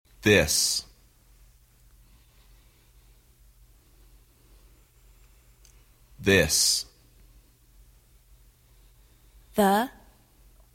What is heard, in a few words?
Percussion